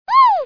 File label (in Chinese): 娃娃呼叫声